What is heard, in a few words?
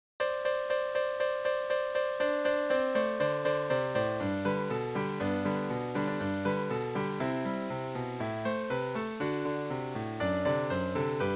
jingle; cstrike; xmas assault